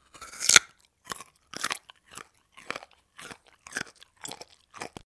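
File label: carrot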